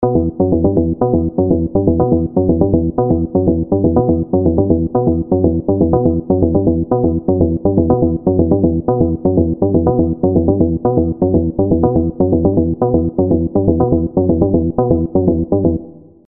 bass; fire by music sound software

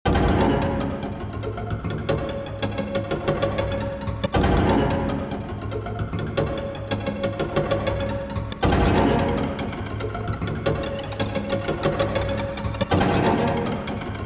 strings; harrowing; infernus strings; elastic sounds

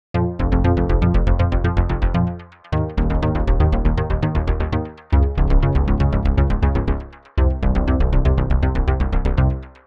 bass; the analog sound waves; scooter

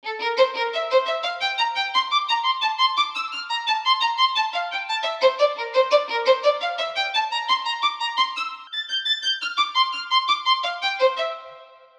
inspirational locrian; twinkle; sampleson

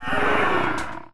zombie crowd; music and sound fx master collection; nova sound

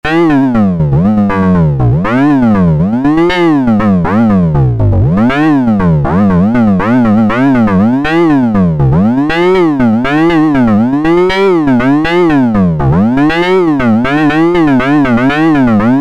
drums

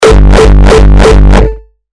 hardcore; serum 2; g0 hardcore

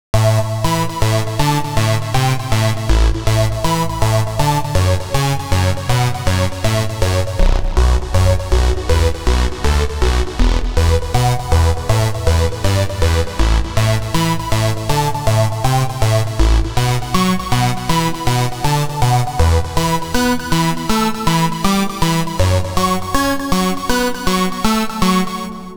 arp; tungsten; the space soundset

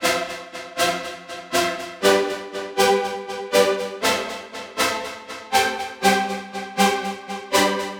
future saws; ultimate vital bundle; rocket powered sound

